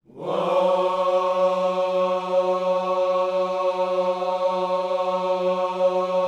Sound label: WHOA F#3, Vocal Planet Gospel; Spectrasonics; Vocal Planet Vol.1 Gospel; akai